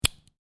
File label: Lego Pull Apart Two Lego Pieces; Miscellaneous Toy Sound Effects